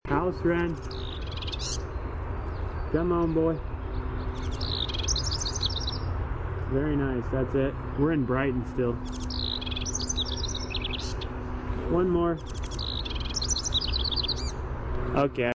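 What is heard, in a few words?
house wren song, Troglodytes aedon; Passeriformes Troglodytidae; Brighton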